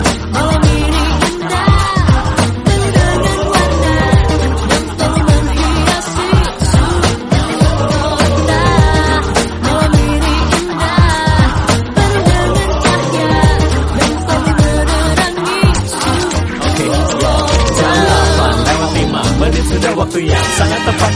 lagu elektro baru nada dering ringtone

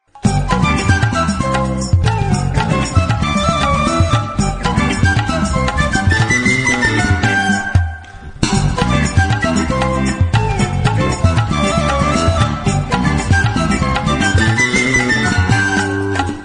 seruling lucu nada dering

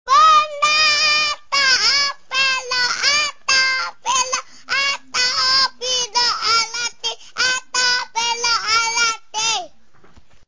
bayi lucu nada dering

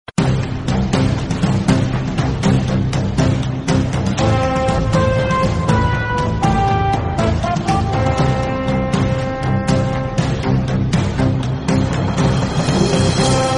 lagu baru indonesia nada dering ringtone

